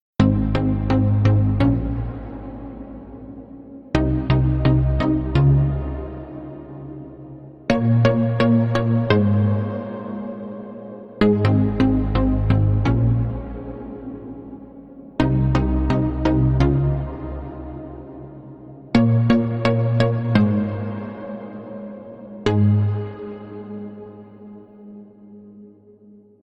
Reverb testing

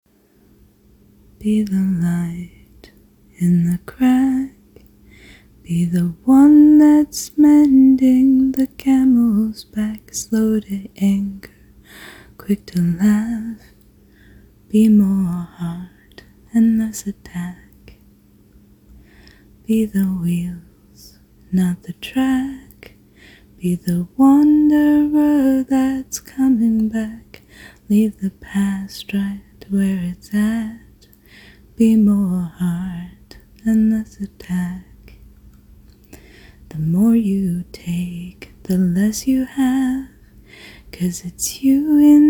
Singing Only; F4A

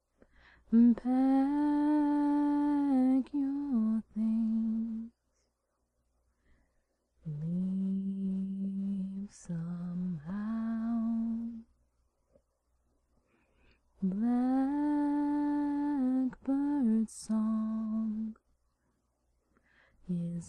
[F] Song

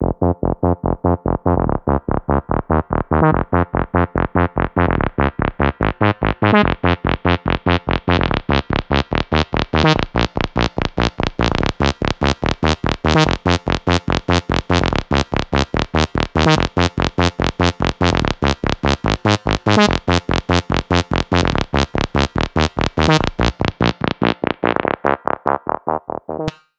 Saw Bass, 145BPM

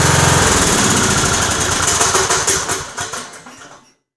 Engine Stop, Generators

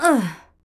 Grunt; Lewd SFX

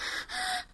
Moan

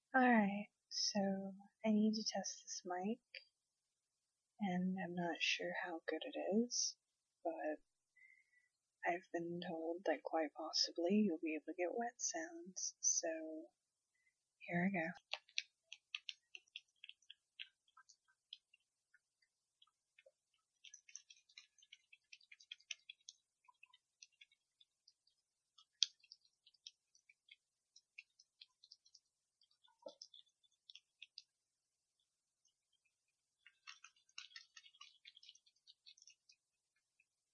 Wet noises mic test

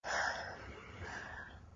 Breath; Lewd SFX

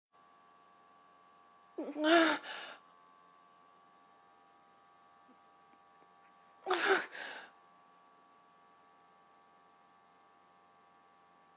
orgasm

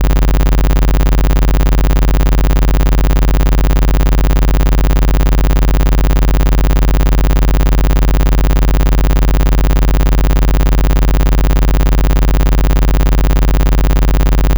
Tone; Generated Tones; a sounds adventure through the intranet

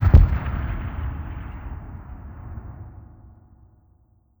city battle

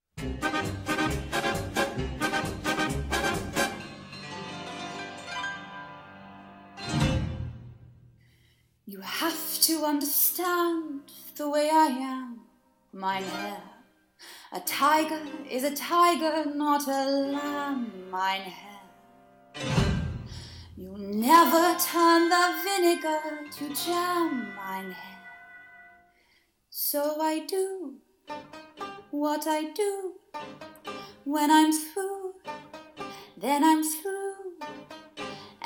F, Cover, Musical; What a bloody brilliant song

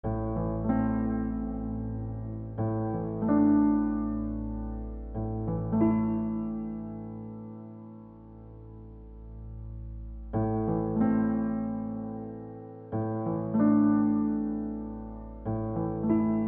simple piano thing

